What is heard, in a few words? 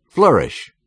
flourish